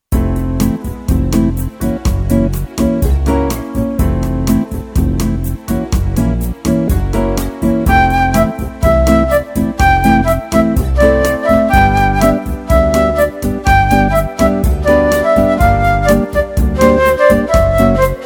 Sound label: solo